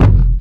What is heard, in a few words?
Kick; Timba; RnB